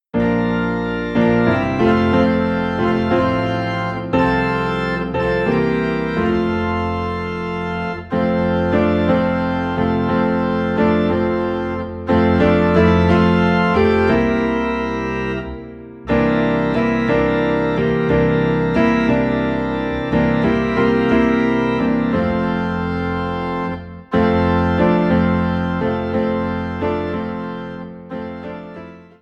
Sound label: Piano and Organ Low in F; Love Lifted Me